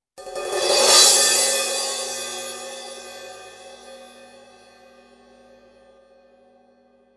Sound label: med crash 16, cymbal; sabian; b8pro